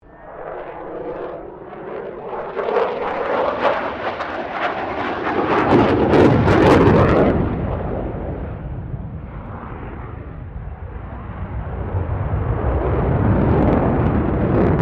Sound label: 2005 F16 FALCON STEEP CLIMB; Airplanes (Sound Effects:Vehicles)